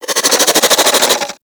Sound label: Infested Crawler Attack; Warframe